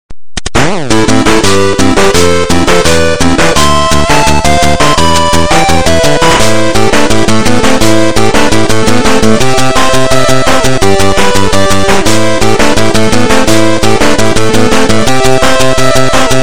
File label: music